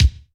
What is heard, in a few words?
raw kickdrum sample c sharp key